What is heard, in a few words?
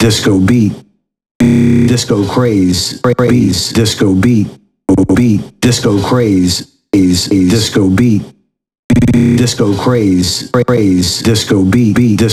House Loops, Vocal Loops House 128BPM